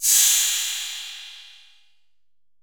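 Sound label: 808 cymbal; Wizoo - Platinum 24 Electronic; 480L SP 808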